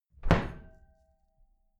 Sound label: wooden door close heavy glass clang